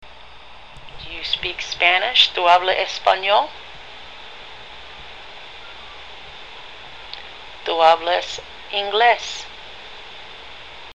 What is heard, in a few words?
Whistle